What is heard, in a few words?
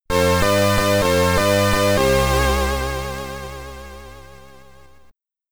Game Over (SID Stereo); Motocross; commodore 64